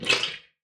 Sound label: creature misc; RPG